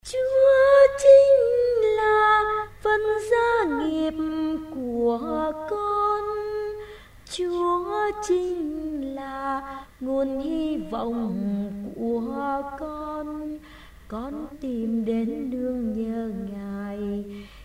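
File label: soprano tenor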